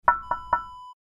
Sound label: monitor screen knock